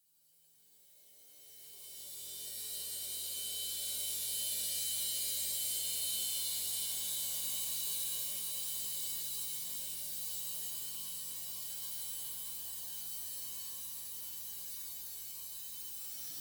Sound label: Shimmer